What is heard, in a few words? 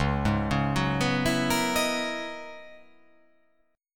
Db Minor 13th, chord; Dbm13 arpeggiated; JGuitar